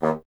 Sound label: WND BSN, Bassoon